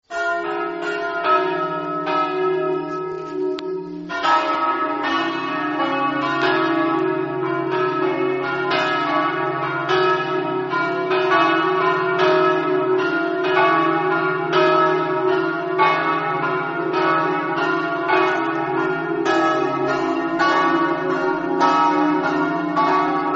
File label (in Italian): campane